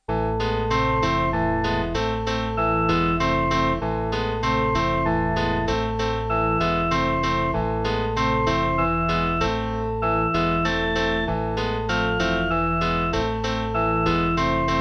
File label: Organ And Piano; Short Piece For Organ And Piano